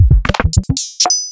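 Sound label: Roland MV8800 drum NUHIP KIT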